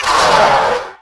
gurp; quake2; bauul

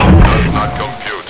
fall; quake2; robot

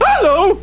bump; quake2; homer1 4

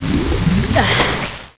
gurp; quake2; link

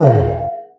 jump; quake2; bauul

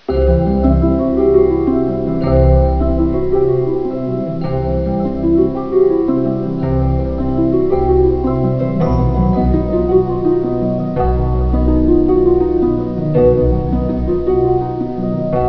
dreamy; quake2; kitsune

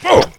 fall; quake2; strongbad

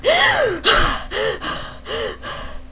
gasp; quake2; buttercup

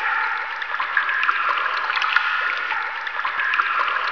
cave; quake2; chaos

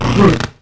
gurp; quake2; fbetch

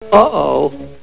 fall; quake2; fuddbeta

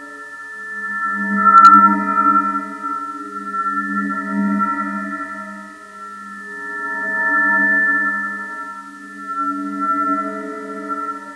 vortex; quake2

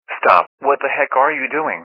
death; quake2; puffin

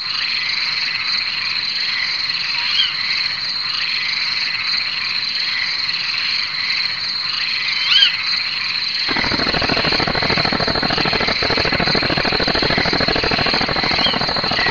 peaceful marsh; quake2; kitsune

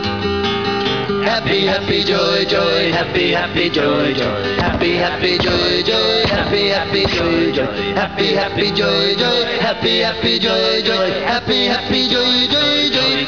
happy; quake2

